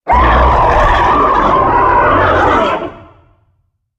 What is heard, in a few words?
Monster